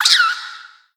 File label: ghoul cry; Crypt of the NecroDancer